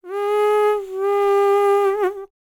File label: HUMAN TRPT, Jazz FX; Spectrasonics; Vocal Planet